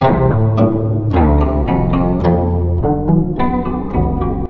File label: KOTO LP FX, Asian Traditions